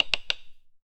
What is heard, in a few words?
short sound wooden chest